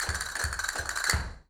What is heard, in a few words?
Castanets, Flamenco